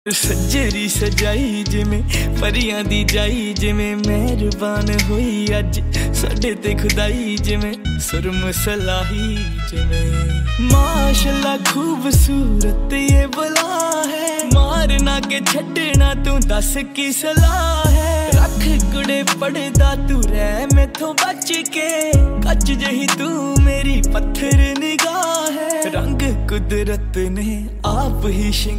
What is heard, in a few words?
punjabi Song Ringtone